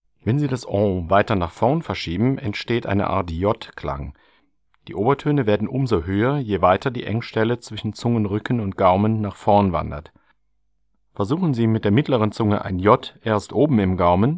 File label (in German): I Technik; Buch Oberton Singen CD